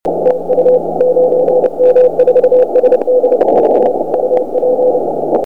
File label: 80 cw